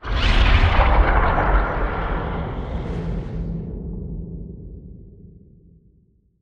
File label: Fastball Whoosh; Beacon; Titanfall 2